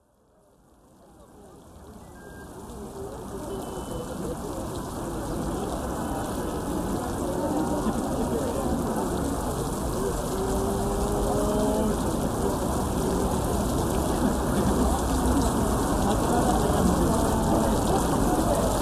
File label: sound map of the egyptian museum